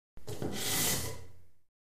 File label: Ping Pong Paddle